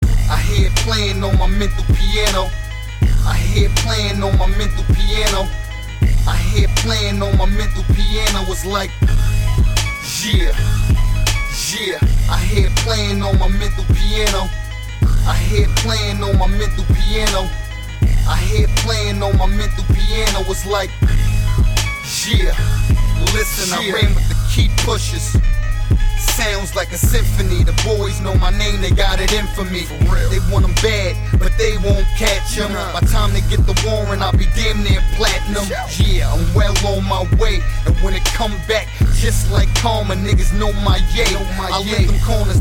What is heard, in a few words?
Mental Piano